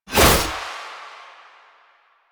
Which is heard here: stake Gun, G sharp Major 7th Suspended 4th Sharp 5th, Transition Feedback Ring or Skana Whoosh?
Skana Whoosh